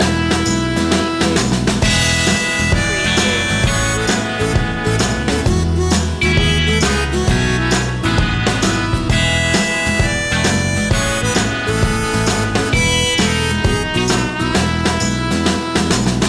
sixties flower power

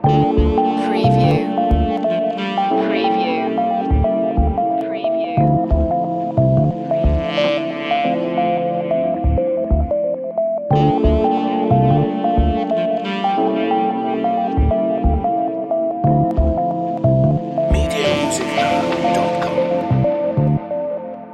the ocean loop

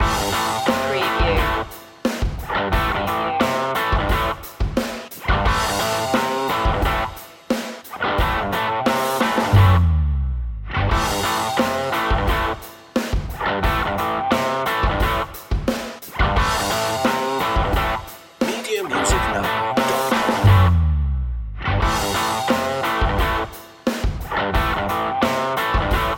total trucker loop

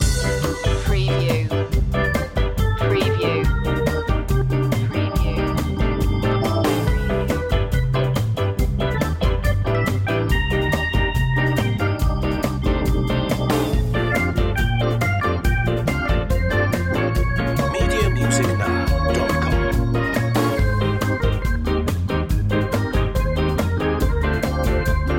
ska loop

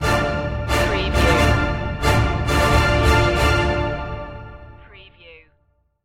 stinger no choir

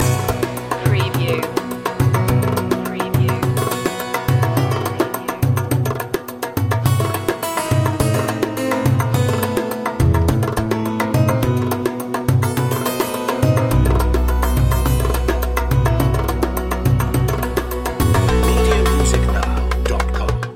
middle eastern oasis loop

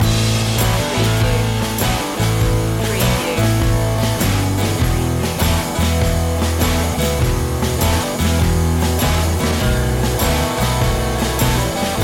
delta sound loop

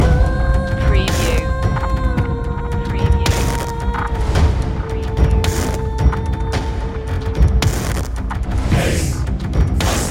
blackout loop

